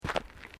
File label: Footsteps Boots Gravel Fine Step